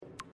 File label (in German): sms anklicken; life is strange